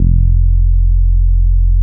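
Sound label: NORD P F2, bass; NORDY BS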